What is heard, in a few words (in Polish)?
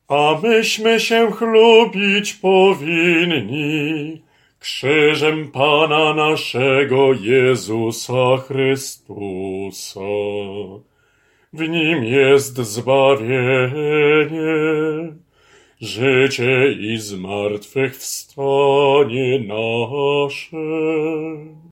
BAS; A mysmy sie chlubic